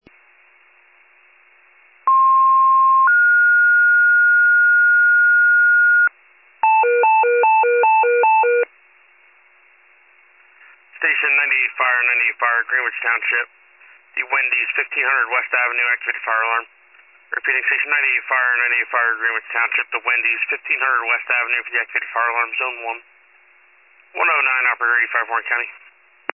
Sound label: fire, pager alert